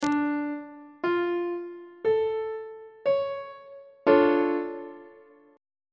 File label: e double flat minor major 7th chord on treble clef